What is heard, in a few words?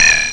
coil spring, cartoon sfx